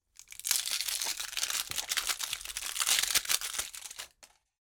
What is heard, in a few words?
wrapper remove plastic toy; foley